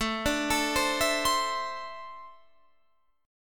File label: E 7th Suspended 4th Sharp 5th